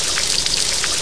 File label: water trickle